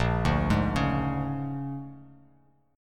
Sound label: Bb 7th Sharp 9th Bb; Bb7#9 arpeggiated